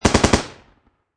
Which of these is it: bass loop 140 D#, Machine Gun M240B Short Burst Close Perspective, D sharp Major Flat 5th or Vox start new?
Machine Gun M240B Short Burst Close Perspective